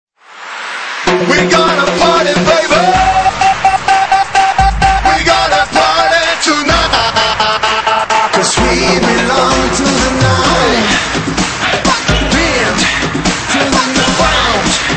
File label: EDM Acapellas; The Groove Society